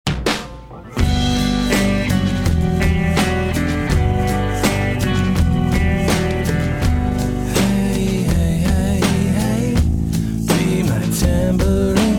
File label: Tambourine